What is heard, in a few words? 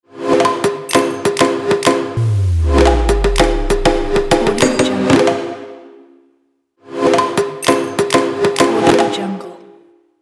modern logo